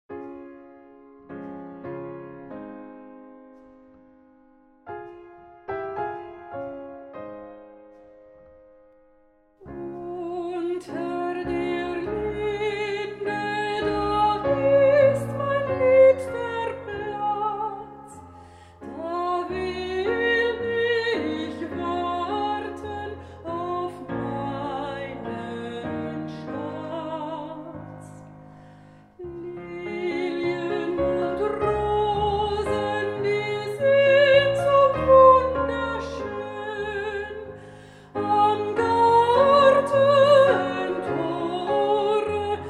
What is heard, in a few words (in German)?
Gesang Klavier Romantik; Koessler; Erwartung